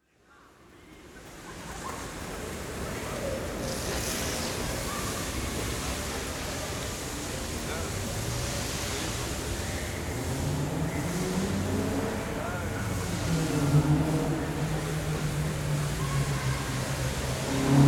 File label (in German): Platz bei Museum